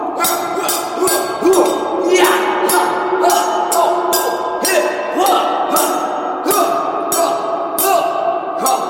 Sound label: swordfighting